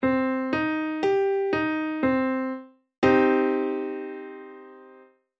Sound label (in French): degre Im